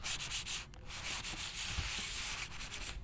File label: Smooth Texture; BB Retail Therapy Sample Pack